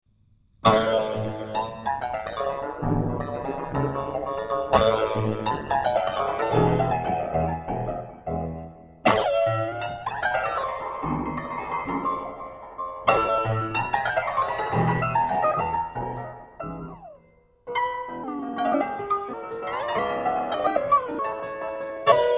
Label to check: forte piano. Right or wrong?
Right